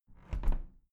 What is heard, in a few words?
window plastic squeak close soft